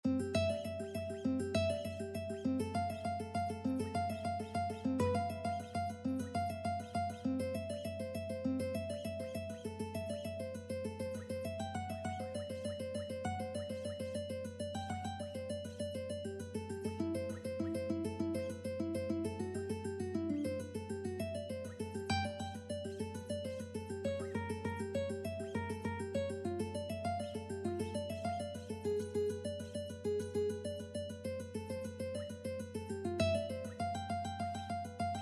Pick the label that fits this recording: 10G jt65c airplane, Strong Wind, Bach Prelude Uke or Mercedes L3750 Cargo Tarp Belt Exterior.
Bach Prelude Uke